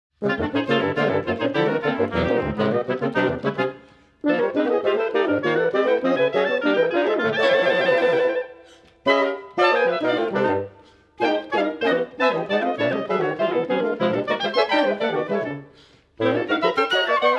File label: Wind Quintet